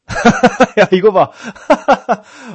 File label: laughter